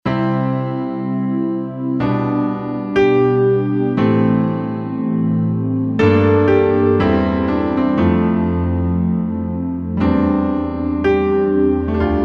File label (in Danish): drama musik; backstage dramastykket